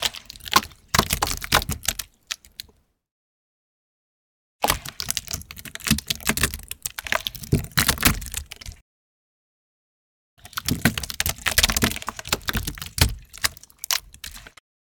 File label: HORROR - Guts flowed on wood surface, light; Game Audio Factory; GAFSFX01 - Dark Materials